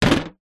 household solid small item set down on metal table